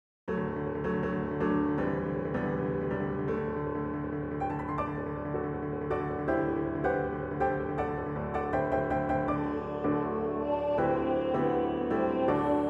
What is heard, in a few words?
Resurrection Fanfare